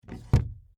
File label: Door Latch Close, Foley, Wood, Door, Latch, Close, Small; Latches; Sound Effects:Objects